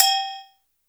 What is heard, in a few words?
agogo; Roland R-70; Perc